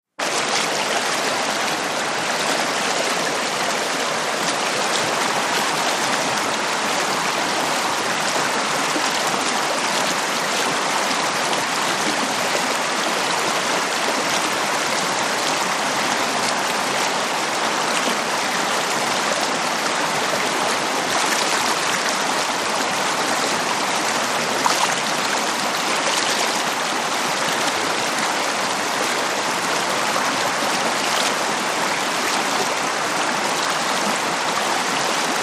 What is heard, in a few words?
River Hvy Flow Rush, rivers